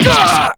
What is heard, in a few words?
damage; jin